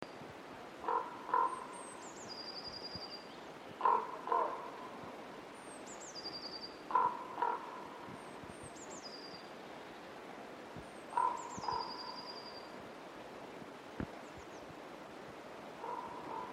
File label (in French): grand corbeau